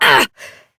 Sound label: Pain Short, Adult; New War; Adult Operator